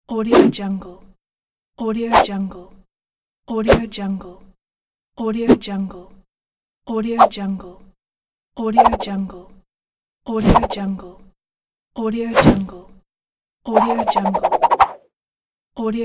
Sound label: whip whoosh sounds